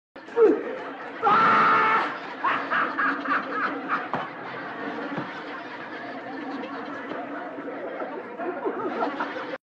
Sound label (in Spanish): risa